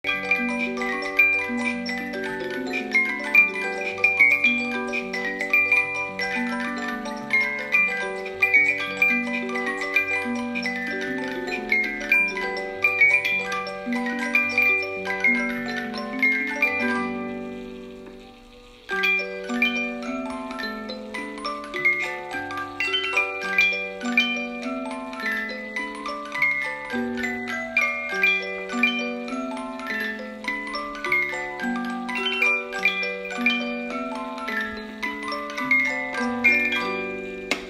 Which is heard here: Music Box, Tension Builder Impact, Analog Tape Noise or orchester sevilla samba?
Music Box